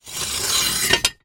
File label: foley metal piece small flat rusty drag scrape on another; zapsplat